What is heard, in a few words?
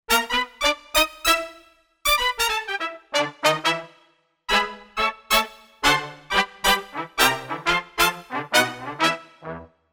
Pop Brs Stac